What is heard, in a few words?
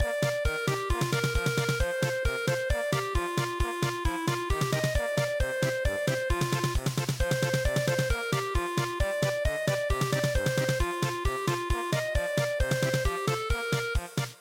polka, midi; Treue Liebe